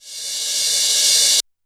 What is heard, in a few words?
E1 - Reverse Cymbal, Yamaha PSR-E323 Electronic Kit